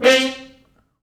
Horns stops

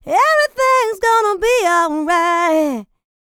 LICKS, Vocal R&B & Dance; ILIO; Vocal Planet

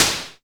Perc Noise Short, Tama TS-206

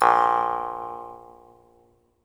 Jaw Harps

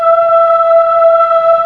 BOYS E4, BOY C; MEL BOYS

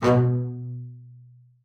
B2, bass spicc; Xsample VOL-5

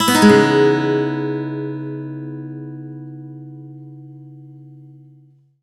C# min9 upstroke, Acoustic Guitar; Sonic Foundry (Sony Creative Software); Songwriter Acoustic Guitar Companion; One Shot Chords

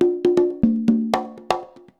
120 CONGAS, Percussion Loops

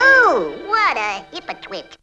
toon; Zero G - Man Machine

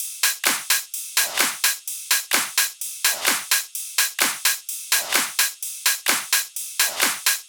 Loops 128BPM, Drumloops

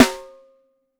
6X12 WOOD

